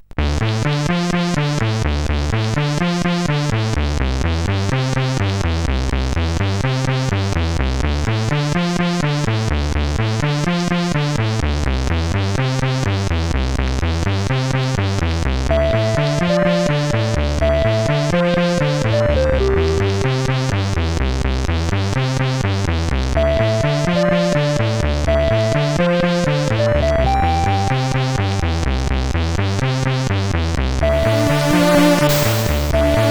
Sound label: sid, CSG8580R5; Note Editor